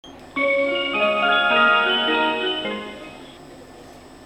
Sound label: chime; hisatsuorange